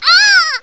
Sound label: Ahhhh; Riviera